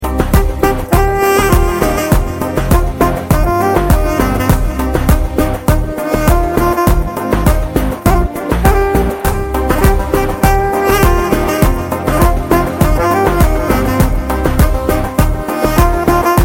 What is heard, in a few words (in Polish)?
Najlepszy Dźwięk Saksofonu